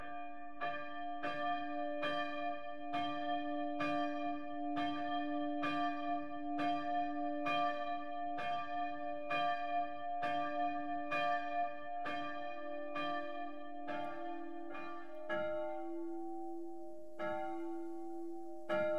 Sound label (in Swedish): klockor, svenskakyrkan; bogen